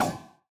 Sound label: ball bounce cold; CrossCode; battle